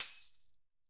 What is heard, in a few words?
Roland MV8800 percussion